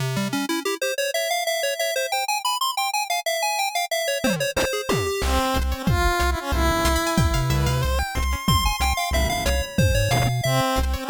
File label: scale comparison arabic